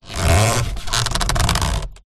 transport car hand grasp steering wheel leather squeak